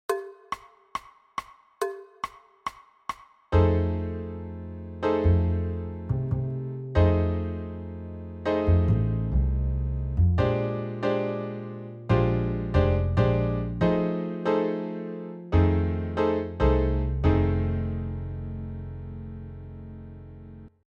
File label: drums rock